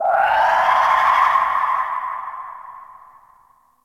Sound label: Night Distant Creature Small; Ambience; Warframe; Eidolon Plains